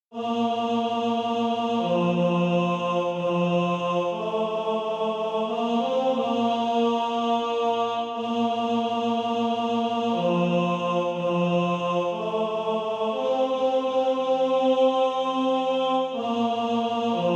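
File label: tenor, SATB Practise Music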